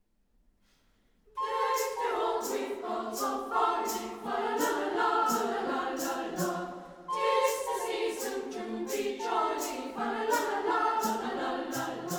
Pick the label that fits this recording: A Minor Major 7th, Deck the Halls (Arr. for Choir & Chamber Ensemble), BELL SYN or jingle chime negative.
Deck the Halls (Arr. for Choir & Chamber Ensemble)